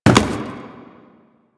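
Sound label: Cardboard Box Impact; Warframe; Ambience; Nineteen Ninety Nine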